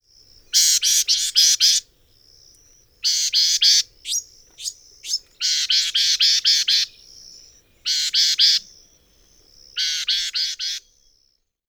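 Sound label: Bewick's Wren harsh alarm calls; OK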